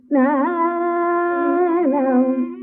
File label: Pantuvarali Shruti E